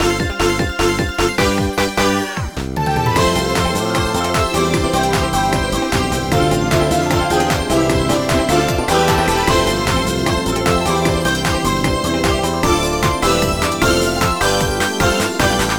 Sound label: Bgm bonustime night common